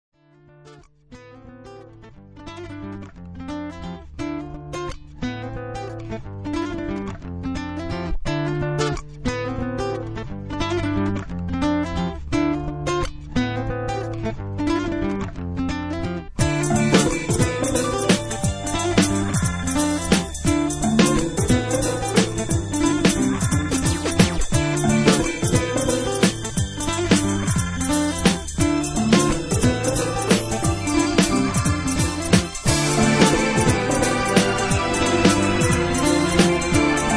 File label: Instrumental